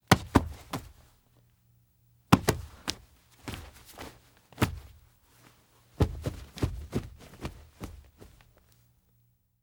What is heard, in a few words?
Body Bumps; Fairlight Sound Lib